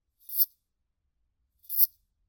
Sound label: madara cricket high rate short